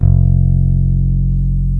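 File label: bass guitar E3, jazz bass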